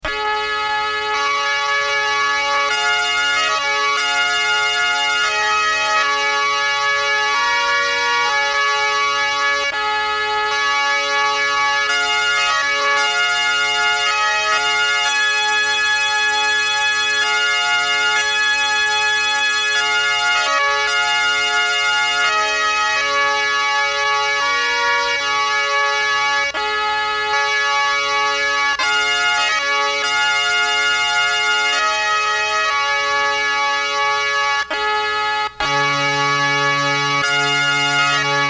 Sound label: Amazing Grace Bagpipes